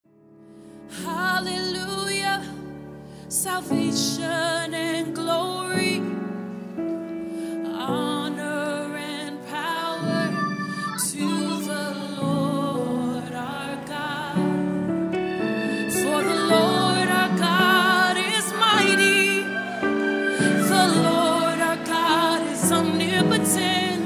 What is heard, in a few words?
Gospel Choir